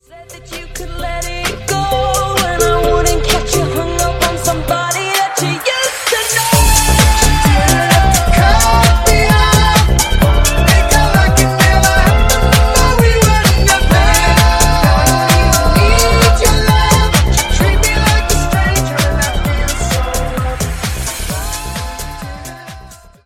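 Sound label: 130bpm G minor Tech House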